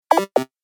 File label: Retro Gaming Sound; Video Game Sound Effects